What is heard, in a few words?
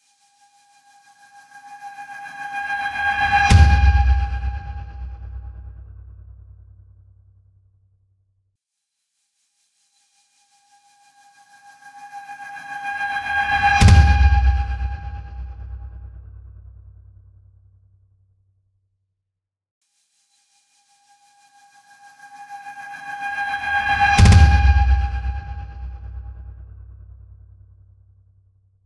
Title Swell